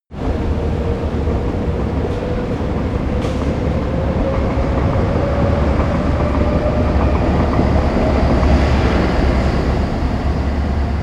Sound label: city train passing